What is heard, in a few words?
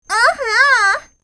"hmmm"; dutch